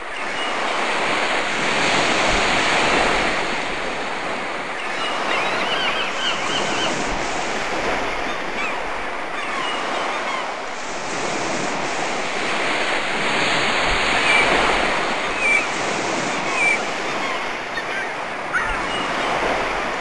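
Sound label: env ocean; Rally Fusion Race Of Champions; XBOX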